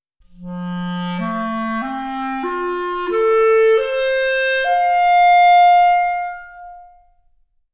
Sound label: clarinet; OmniPower4296